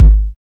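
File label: bass drum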